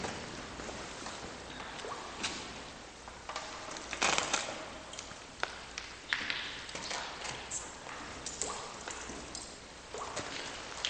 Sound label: Mineral Drip